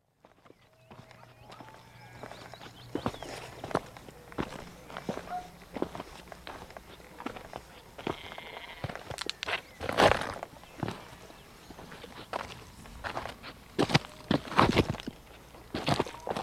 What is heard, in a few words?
Walk Soundscape; Cwmdu